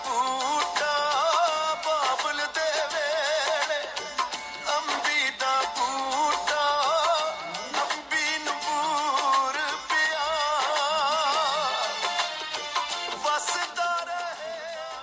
sweet punjabi sad ringtone

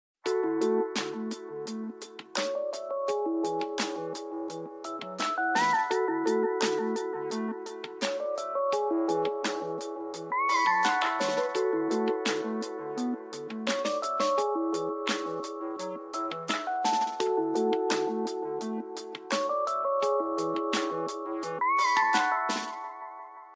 samsung galaxy note 10 ringtone

